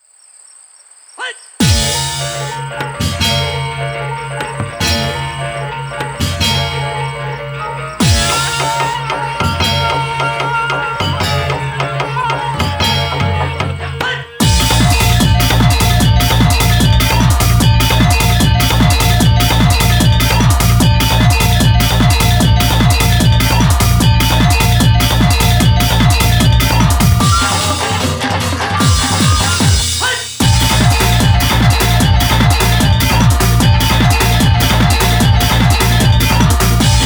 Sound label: Gamelan de Couple; Dance Dance Revolution; PS3; Xbox 360